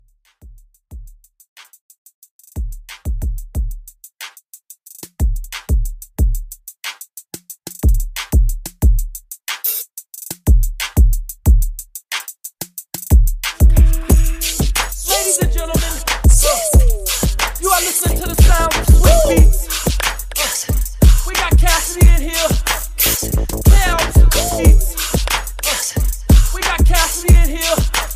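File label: Old Skool HipHop Redrum 91 BPM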